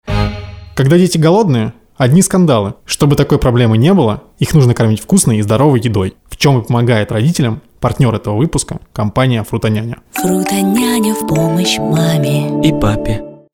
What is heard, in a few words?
example jingle